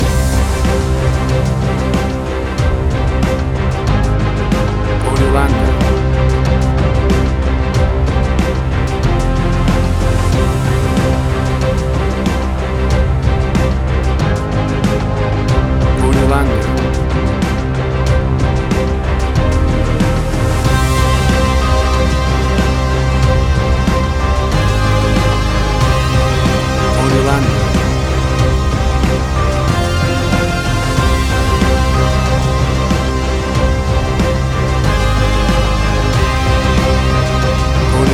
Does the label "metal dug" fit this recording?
No